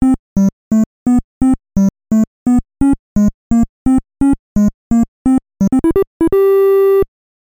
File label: Charge; Double Dribble; NES